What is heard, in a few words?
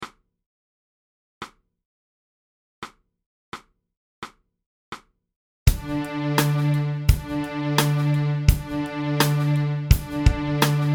D Smooth One